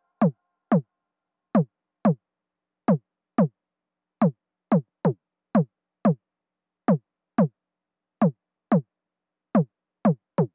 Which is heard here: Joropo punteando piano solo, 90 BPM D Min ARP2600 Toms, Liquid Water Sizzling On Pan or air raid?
90 BPM D Min ARP2600 Toms